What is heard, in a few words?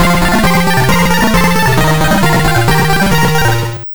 Level Complete